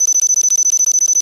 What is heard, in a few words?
computer pipipi mono